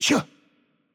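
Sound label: Attack Light, Czech; bloom minigame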